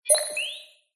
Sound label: science fiction cyberpunk robot cute beep; zapsplat